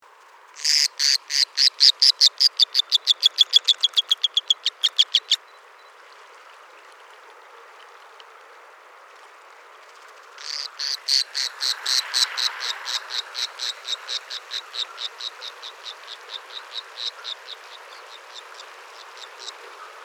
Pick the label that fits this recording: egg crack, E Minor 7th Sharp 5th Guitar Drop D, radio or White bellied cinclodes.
White bellied cinclodes